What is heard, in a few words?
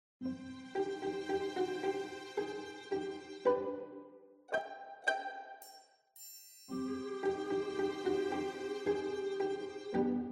Orchestral Arrangement; Paper Mario